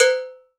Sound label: HAND BELL, Latin Hand Perc; E-MU Producer Series Vol. 2; More Studio Essentials; Composer